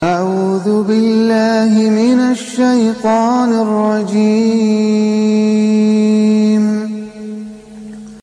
quran ringtone; Islamic Ringtones

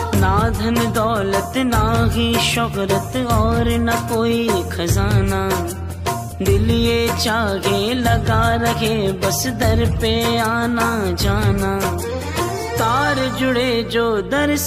Hindi Bhajan Ringtone; Bhajan Ringtones; Hindu Bhagti Ringtone

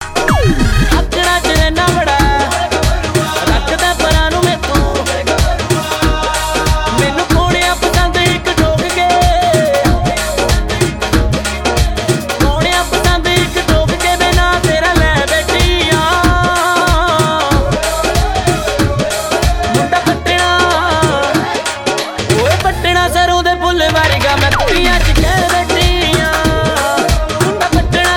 Punjabi Bhangra Tones; Ringtone

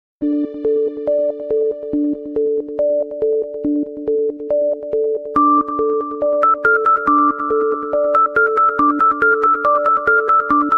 beautiful tone, Love Tones Ringtones

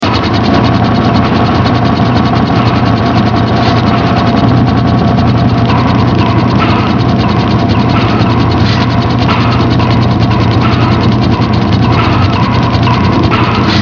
Gears; Water; Weather; Ringtones

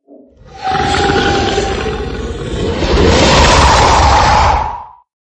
Alien Snarl; Very-Funny-Ringtones

